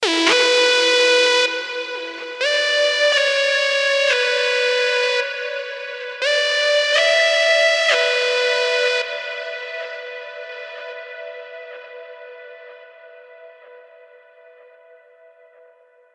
Alarm

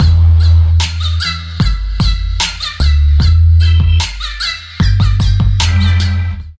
unhuman beat; hip hop

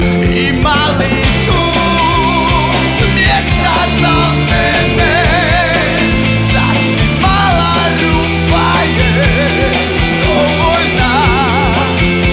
urban beats

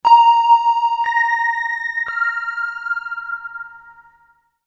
space signal; ringtones

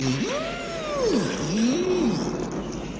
Whimpering

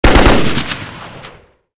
gun shot, sms; ringtones

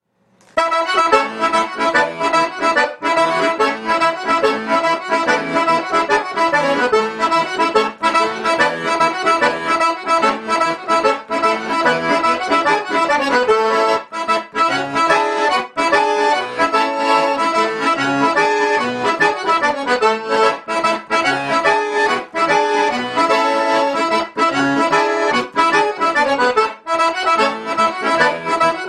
tarantella calabrese

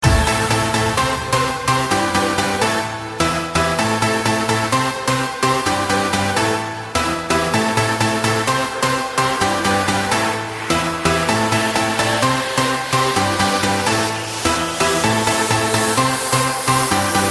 knocking house, ringtones electronic

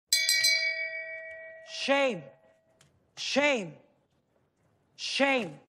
Ding

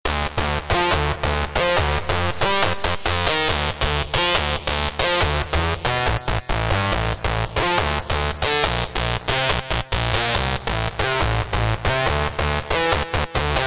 dark trance; electronic

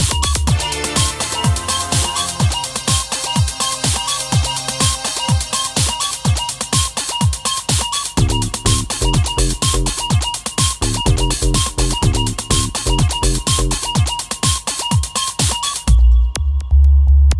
LFO

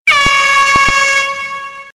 air horn

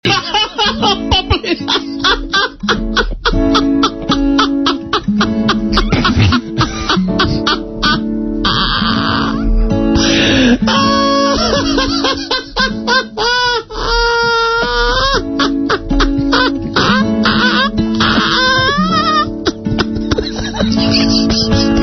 super laugh; children

